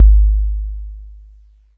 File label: E Mu Proteus FX 909 Kick